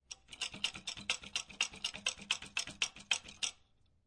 Toilet Handle Jiggle Shake, Household, Toilet Handle, Jiggle, Shake; Bathroom